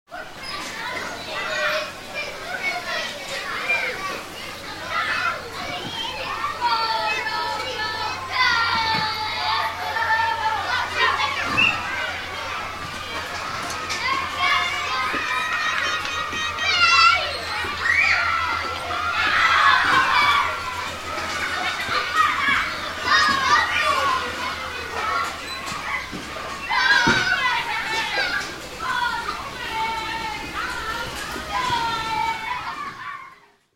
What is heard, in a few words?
Children playing in school playground; Playgrounds